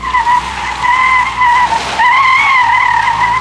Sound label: slip; Mafie 1